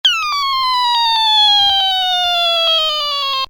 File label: Toy, Small: Bomb Drop Effect; Bombs; Explosions